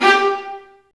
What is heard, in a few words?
STR, strings; Roland - LA Composer vol. 1